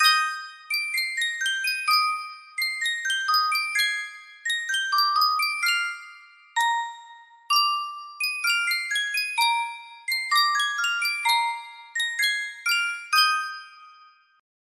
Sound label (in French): thorens 12 note boite a musique